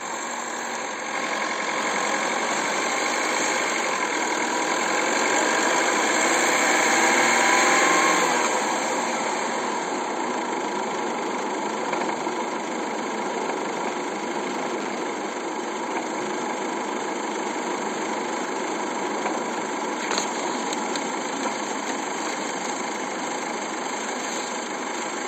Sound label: e 220 d 4matic strange noise suspension steering; e-class w213